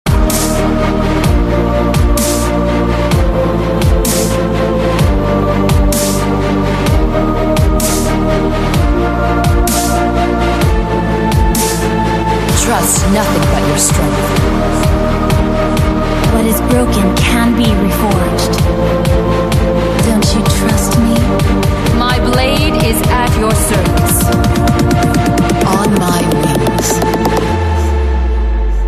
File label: epic dubstep remix; League of Legends